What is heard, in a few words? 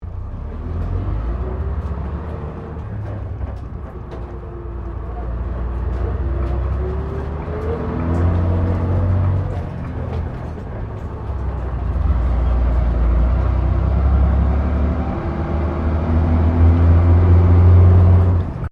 Bus Double Decker Bristol VR Interior Accelerating